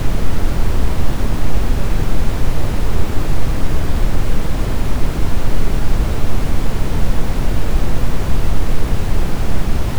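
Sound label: Brown, noise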